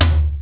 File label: Drum